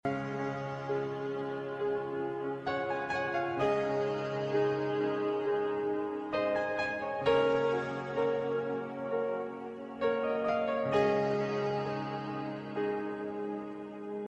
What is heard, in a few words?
piano iphone se new mix ringtone